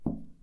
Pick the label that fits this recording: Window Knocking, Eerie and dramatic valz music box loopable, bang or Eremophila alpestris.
Window Knocking